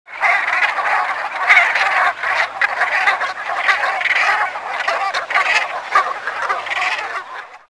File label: branta bernicla